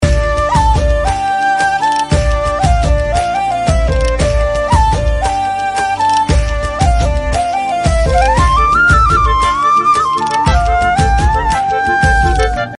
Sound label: Flute Ringtone